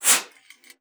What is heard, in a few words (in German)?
PUSTE, bassoon; Xsample VOL-6